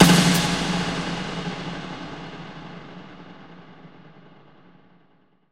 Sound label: Snare Chamber; Zero-G Sound Sense Grime Scene; 90 s Sample CDs